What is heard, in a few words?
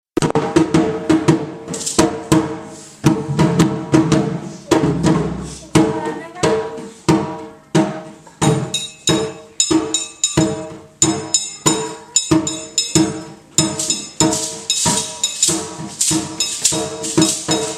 Africa week drums